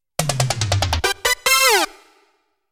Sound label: Failure; Miracle Adventure Fighting Cavemen 3 Starring Joe Mac